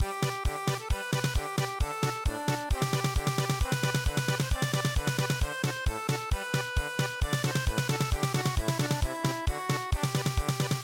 Rhythm Together German Polka

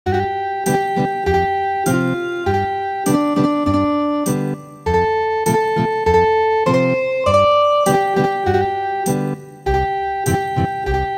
Ethnic Guitar, traditional; The bales are fun

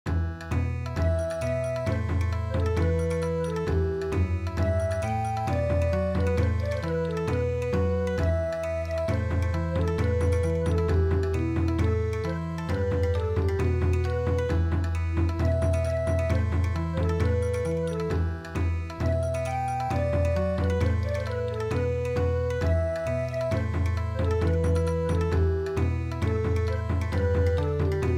Bon Odori

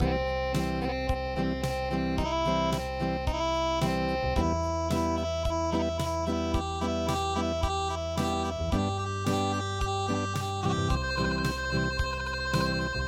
unpluged; midi; traditional

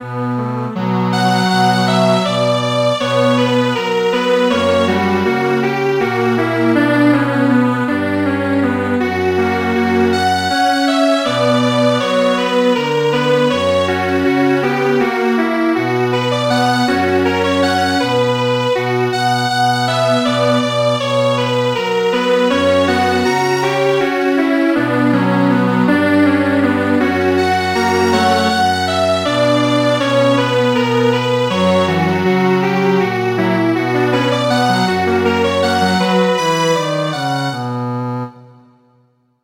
Strings Quartet, traditional; The Little Bell

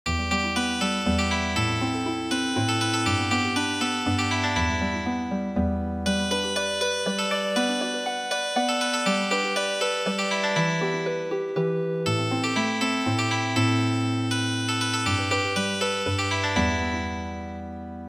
chonan samugori Healing Harp

